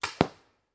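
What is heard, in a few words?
Paintball Gun Impact; Sound Ideas; Guns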